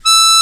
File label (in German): MUNDHARMO; Alpine Volksmusik